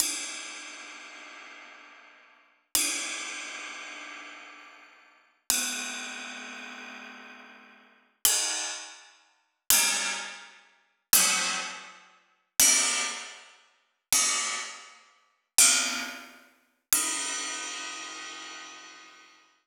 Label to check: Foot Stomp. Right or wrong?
Wrong